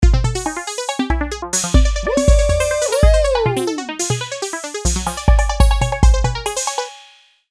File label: dance song